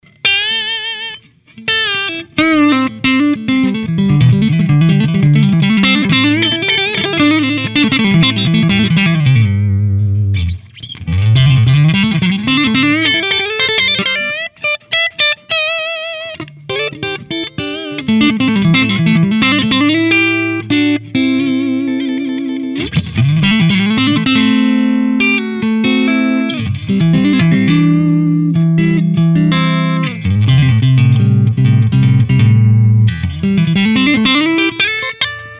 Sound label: Clean Fingerpickin; REV100HD